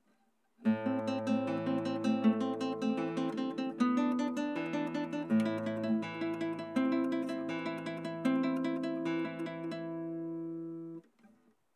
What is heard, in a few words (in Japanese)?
アルハンブラの思い出 練習